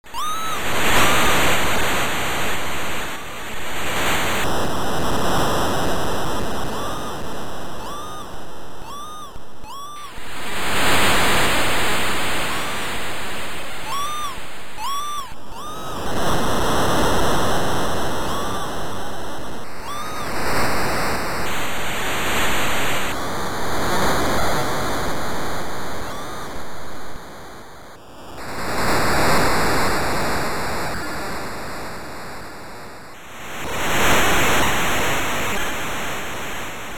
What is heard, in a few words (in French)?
Bruit De Vagues; CPC; D. Cardon